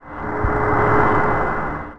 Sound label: victim static